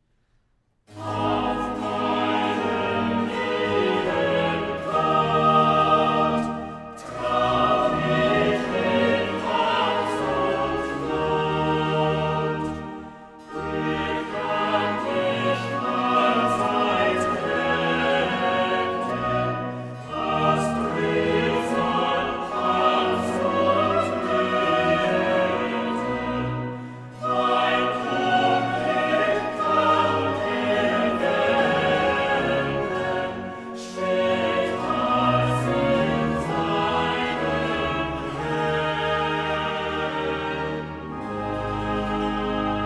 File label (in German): Chorale Auf meinen lieben Gott (Chorus); Ich habe meine Zuversicht, BWV 188